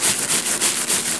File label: SCRATCH